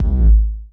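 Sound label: 3D kick 909, 3D ambience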